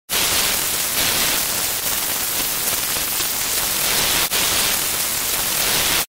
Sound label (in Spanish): estatica sonido